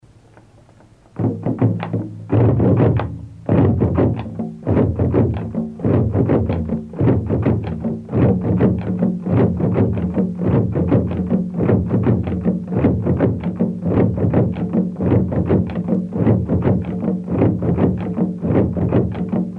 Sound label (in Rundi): ingoma, burundi